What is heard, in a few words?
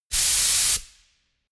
pneumatic pump long